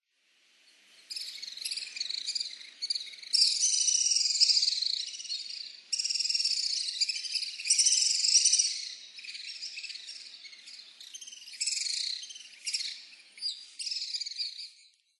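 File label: House Swift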